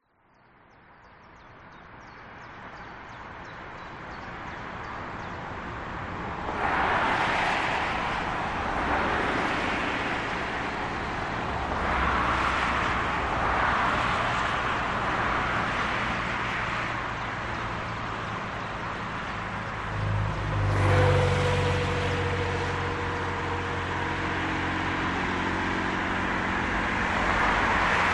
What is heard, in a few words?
traffic Germany autobahn a8 med busy